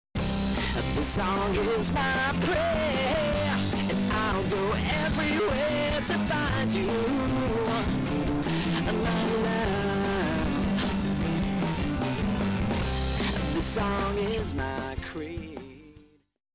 my creed digital clipping, mortifyingly awful digital distortion; Here's an example of mortifyingly awful digital distortion using a clip from my song; crackly